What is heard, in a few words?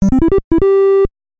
Charge; Double Dribble; NES